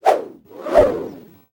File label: designed whoosh fast whoosh and slow reversed; transitions and movement